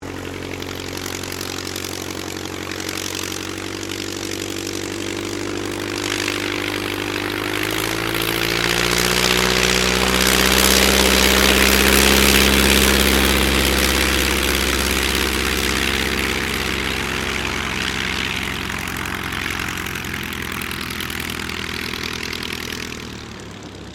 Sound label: AIRCRAFT DH82A DEHAVILAND TIGERMOTH TAXI; 1940S; Mixed selection of Tigermoths from the 1940s